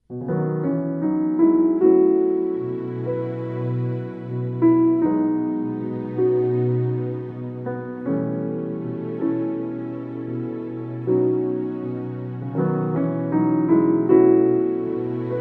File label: With Strings